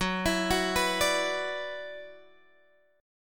F sharp 7th Suspended 4th Sharp 5th, Guitar Open G; x,11,14,11,12,12